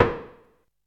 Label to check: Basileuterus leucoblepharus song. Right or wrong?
Wrong